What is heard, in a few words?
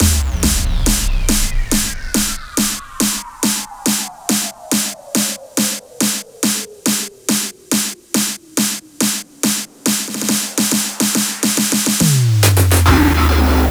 Fills, Loops 140 BPM